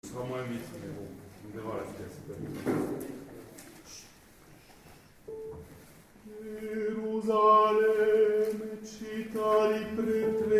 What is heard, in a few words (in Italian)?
Soprani; Gerusalemme